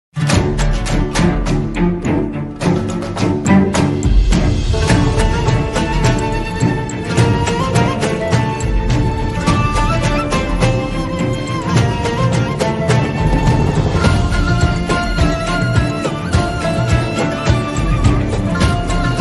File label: background music